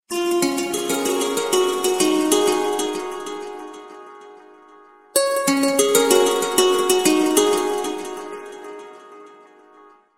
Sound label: Sms guitar Pan